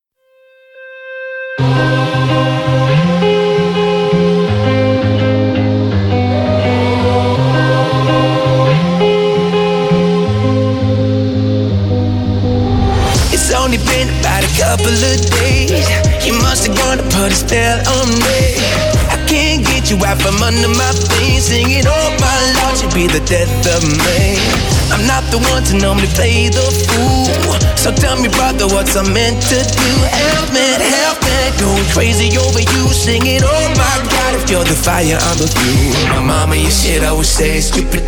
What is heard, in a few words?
hit music; streampal